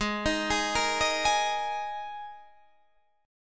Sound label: Gsharp Suspended 2nd